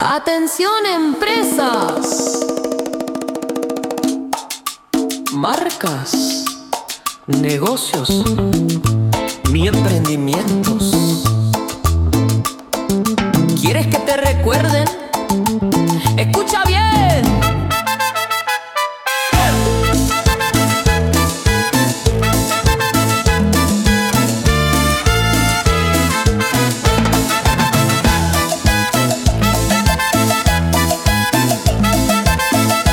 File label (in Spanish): CANTO COMERCIAL